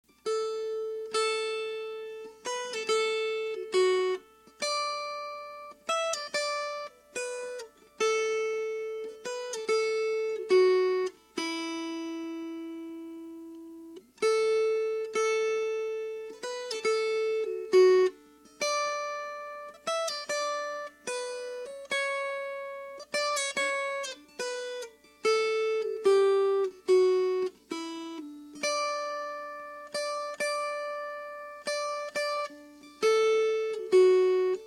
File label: Melody; Hold The Fort